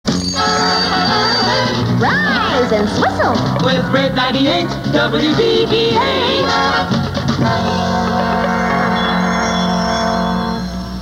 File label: Rise and Whistle; 980 WDVH AM